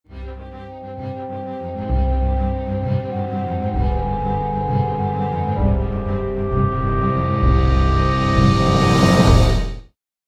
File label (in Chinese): 大气的背景音乐